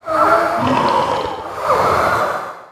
Zombie Throat, Monster Sounds; The Decline Of Warriors; Monster Sounds Volume II

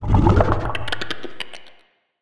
Alien Passying by, Monster Sounds; The Decline Of Warriors; Monster Sounds Volume II

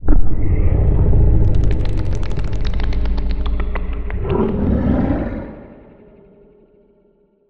Low Roar Distant, Monster Sounds; Monster Sounds Volume II

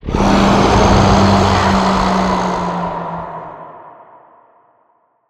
Distant Absctract Scream, Monster Sounds; Monster Sounds Volume II

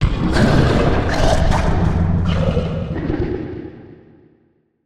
Low Roar Strong, Monster Sounds; The Decline Of Warriors; Monster Sounds Volume II

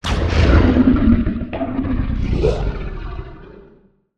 Feline Short With Tail; Monster Sounds; TheDeclineOfWarriors; Monster Sounds Volume II

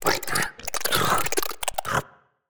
Alien Tiny; The Decline Of Warriors; Monster Sounds Volume II

